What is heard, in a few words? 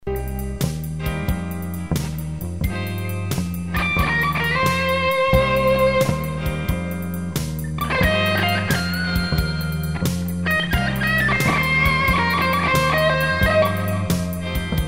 city blues licks